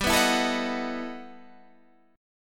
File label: E Minor Major 11th G, guitar